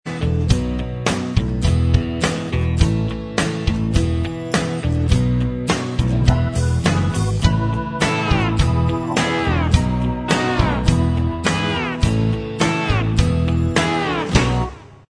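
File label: instrumental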